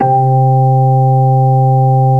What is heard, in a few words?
Organ; Organ 2; GMTones